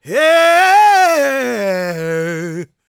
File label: GOSP MALE, Vocal Planet Gospel